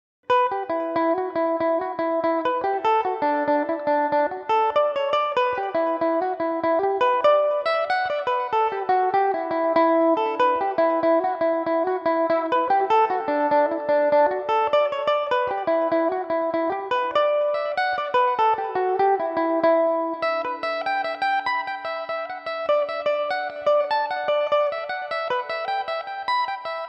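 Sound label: E minor, Irish mandolin; con curtins big balloon; belmuse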